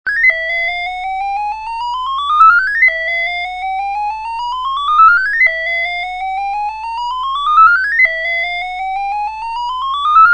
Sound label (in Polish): syrena